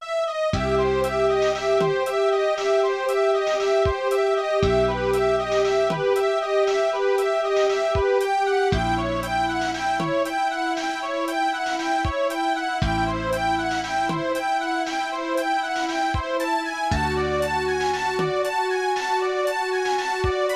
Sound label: Message Sad